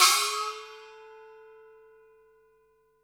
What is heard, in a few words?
CYMB FX; Univers Sons - Raricussions